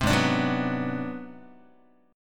G sharp 7th Sharp 9th Flat 5th G sharp down Guitar, chord; G#7#9b5; strummed; 3,4,5,4,3,x; JGuitar